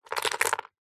foley gemstones several small opal amethyst jade set down on surface